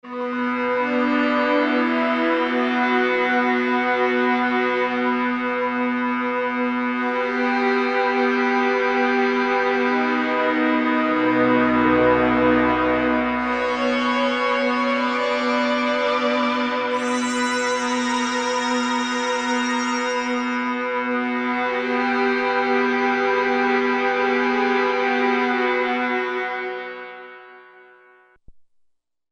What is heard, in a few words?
Cool Str, Korg MSC-14S Sound Effects Combinations